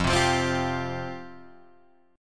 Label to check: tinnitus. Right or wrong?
Wrong